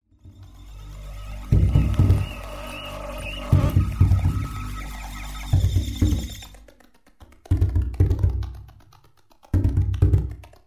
barre

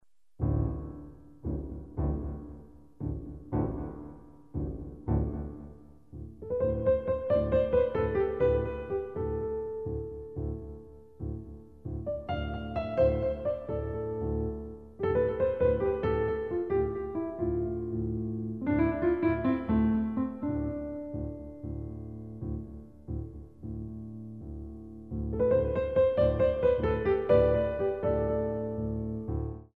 piano

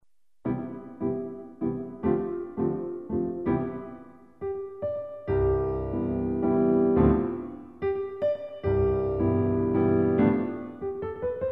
piano

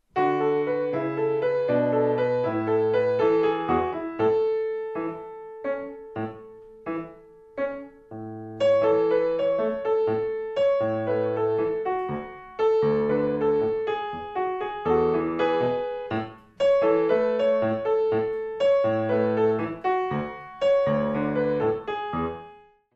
tendu

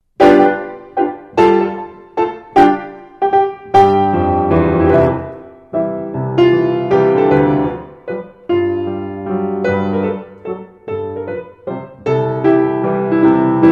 mens waltz

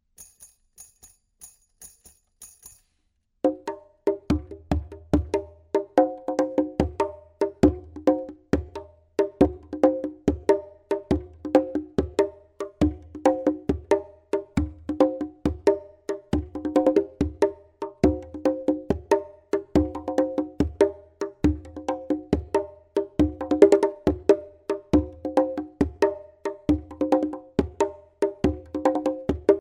body drum

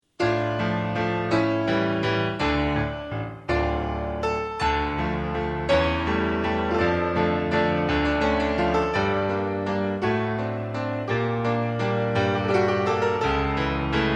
grande allegro

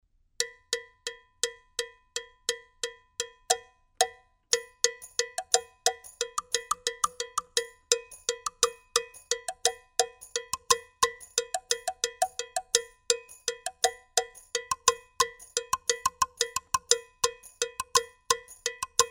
body drum

